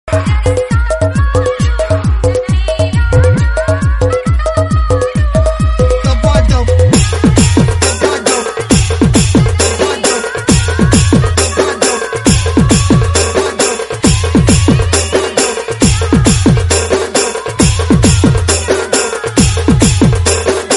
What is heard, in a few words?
remix